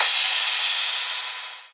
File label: Crash, Cymbals